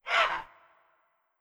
Ara Parrot; Ara Parrot V O C2

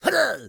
Pirate Birdnest; rabbids multiverse; iOS, macOS, Mobile

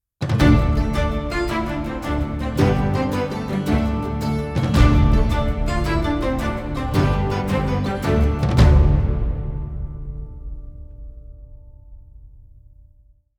Sting Level Up Available Player

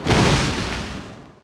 Particle Explosion

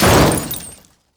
Building Particle Falls On Floor

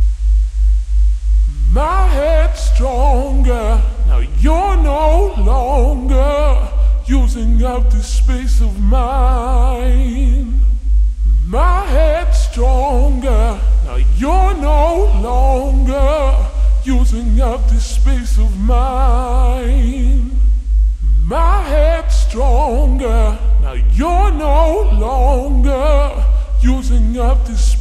Music Loop; Infinite Hole; Music Loop 16

